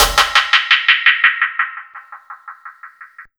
TR DELAY; Ragga & Dub Reggae; USB Soundscan vol.27; AKAI

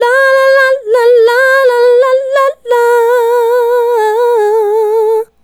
Female Solo, Vocal

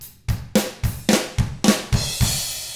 POP ROCK, Drums; ILIO; Double Platinum Drums 1

